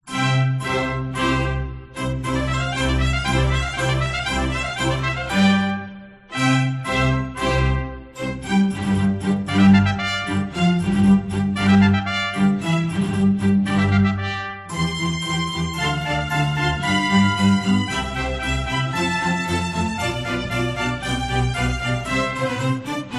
Vivaldi Concerto for Two Trumpets in C Major Allegro